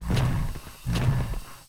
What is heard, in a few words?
STONEBLOCK PEACE WALK